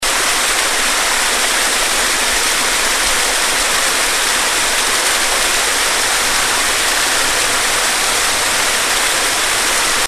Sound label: Wintergreen Falls